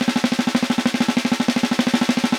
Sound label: Vintage Snare Drum